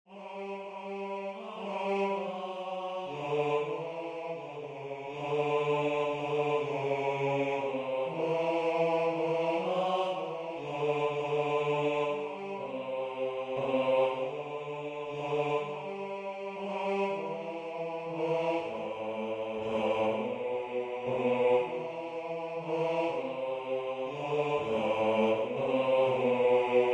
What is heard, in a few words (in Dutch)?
O kindeke klein, Mannenstemmen